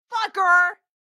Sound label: car reaction; Blind Drive